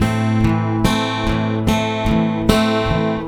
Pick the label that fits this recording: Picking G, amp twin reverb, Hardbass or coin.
Picking G